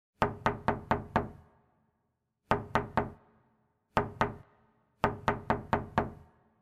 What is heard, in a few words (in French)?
TOC TOC PORTE